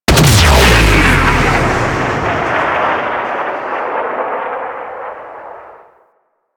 Artillery Launch; Enemies; Abilities; Teralyst

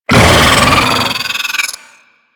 Pet Vocal Angry; Enemies; Vocals; Warframe; Alad V Pet

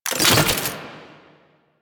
Alchemy Grenade Drop; Warframe; Entrati

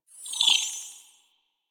Hub Railjack Hologram End; Tenno; Warframe; Ambience

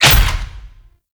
Melee Slam Impact; Warframe; Necramech

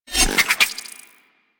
Spin Attack; Warframe; Hyena Micro; Attacks

